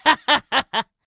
Caramel All Sound; Jolly Battle

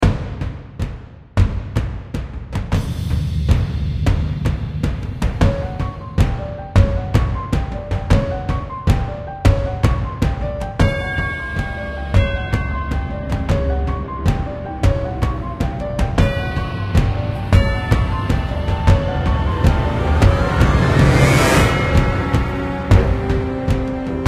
game combat loop; Arcane Quest 3

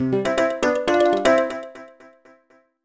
Jingle Neutral; Virtual Villagers: A New Home; NDS

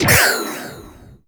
SFX - Wipeout

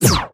Telebird Zap

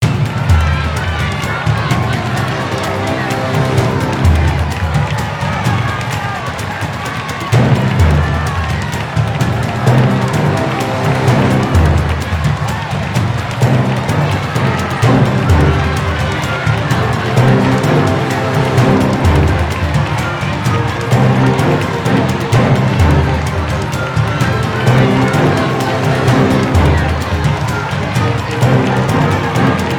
Music Loop; Clash Royale original game soundtrack

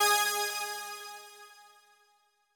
Rush Clear Ab

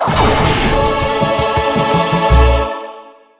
Beacon Piece Collected; madagascar the video game unofficial soundtrack

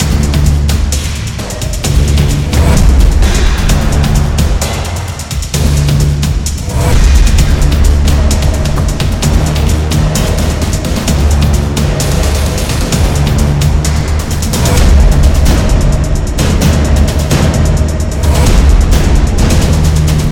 Military Combat; Synthetik Legion Rising OST

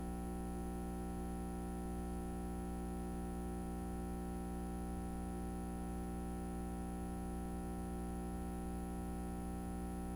light buzz; teardown; ps5 windows xbox series xs

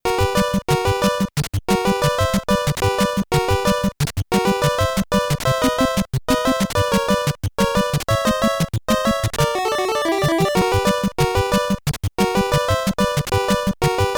Bluegrass (NES); Snake Rattle n Roll

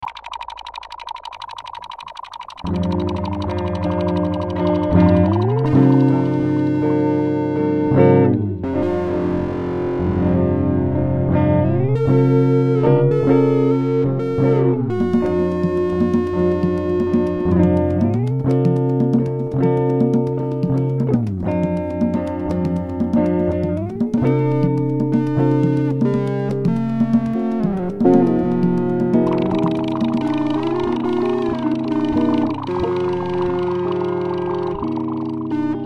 Airship Song; Hylics

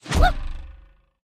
Globe Bird Special Activation

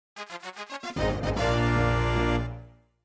MIDI Fanfare; Star Merc

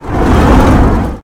Melee Fire; The Battle for Wesnoth

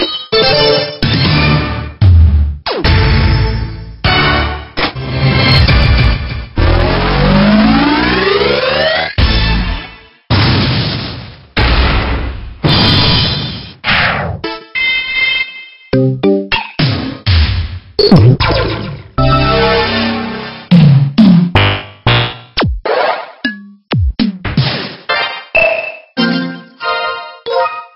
Cursor SFX

